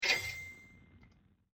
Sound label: cart open